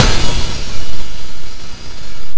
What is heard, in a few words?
HiHat opened, Bb3; Guitar Rock Tour